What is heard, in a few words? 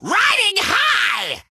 Rocket Taunt